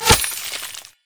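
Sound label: frost shot on hit; LoL; ashe skin06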